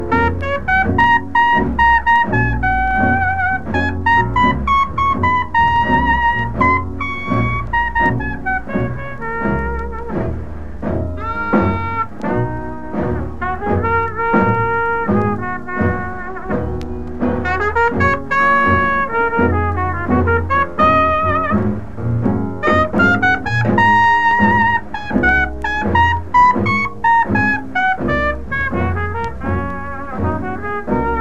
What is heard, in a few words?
Blues Improvisation